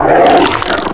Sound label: bite; hunger; hungerhound; rex